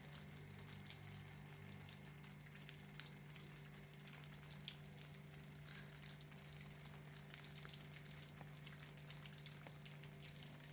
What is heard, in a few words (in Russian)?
sosulki taiut vesnennii kapel